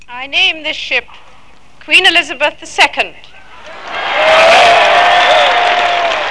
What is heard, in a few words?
launch